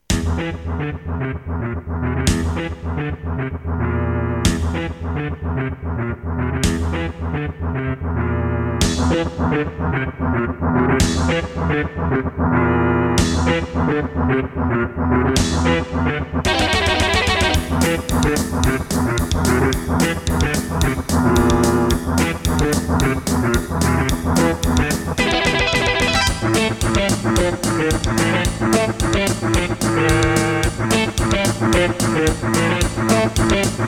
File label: awe32 1mb rom; doom